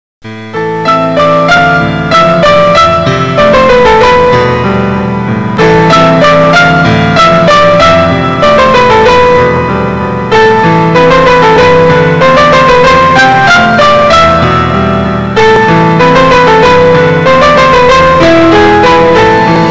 Suspense tone

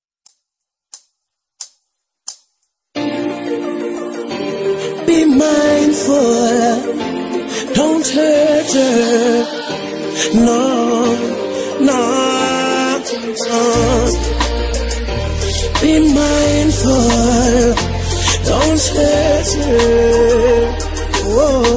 riddim

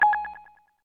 Card Compose Roll

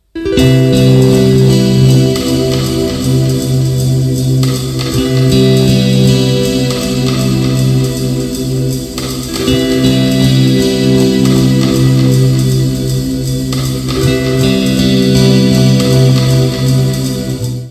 Nice And Easy Guitar

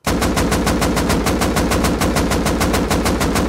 Assault Rifle; Rust

